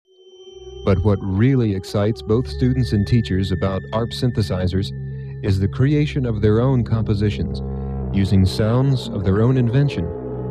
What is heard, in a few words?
ARP; ARP 2600 Demo Tape - Composition